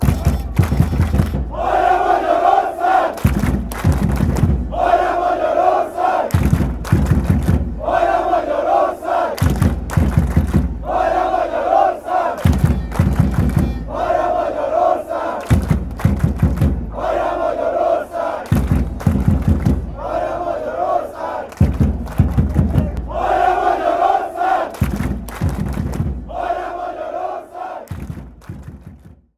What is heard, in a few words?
chant, hungary, fanchants